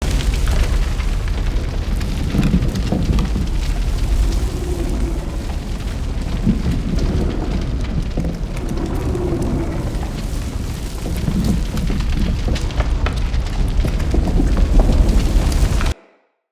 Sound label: Root Cave Living Tunnel Loop, Ambience; Props; Neathuns Root Cave